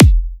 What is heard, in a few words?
Electro Kicks